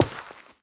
step light, footsteps, player, carpet

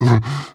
breath short, zombie

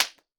bullet by supersonic crack; weapons; nearmiss; Counter Strike Model Pack; CS2

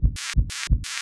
headcrab canister ambient; Half Life Model Pack; Half Life 2; ambient levels canals

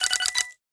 bonus award; Counter Strike Model Pack; CS:GO; survival

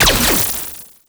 orb bolt

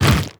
twitch, zombie blind, foley; creatures

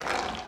writhe; creatures; Half Life Alyx; zoo

